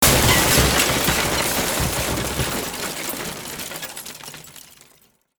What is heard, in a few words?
Crash Massive